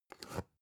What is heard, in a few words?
spice jar big scratch close soft